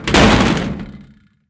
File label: riffle drop metal thin; STALKER; IWP